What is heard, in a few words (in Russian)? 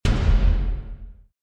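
взрыв террористов; Звук взрыва от бомбы или снаряда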